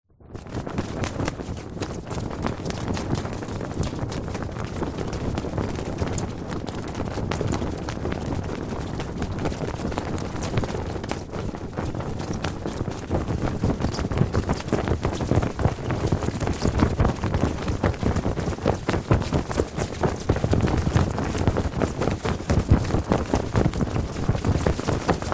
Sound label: foley flag flap medium strong wind; zapsplat